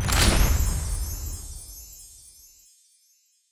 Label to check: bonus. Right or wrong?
Right